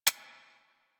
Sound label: Decoy Counter Measure Mech